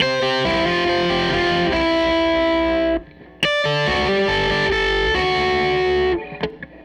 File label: Strat Guitar Loop